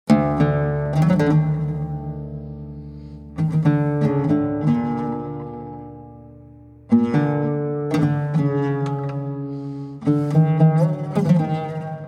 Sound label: Ud improvisation in Makam Hicaz, Sufi Music from Turkey